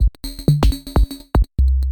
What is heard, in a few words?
Rhythm maker loop 125 Bpm